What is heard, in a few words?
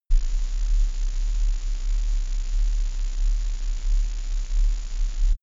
space alien machinery steady calm